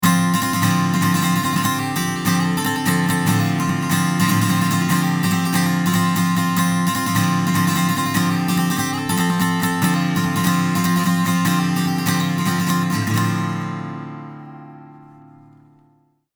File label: Heritage Audio HA 609A Acc Guit Comp